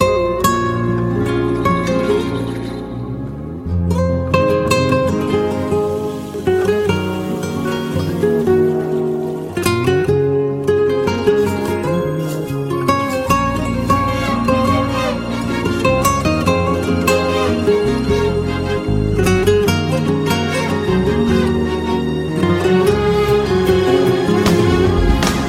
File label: Classical